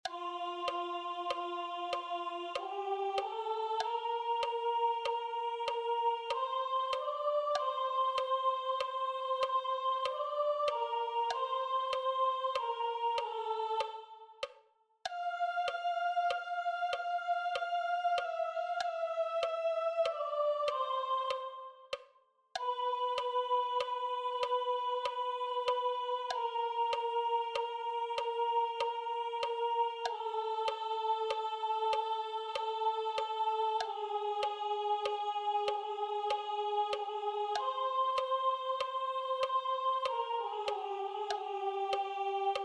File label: sopranos; mozart laudate dominum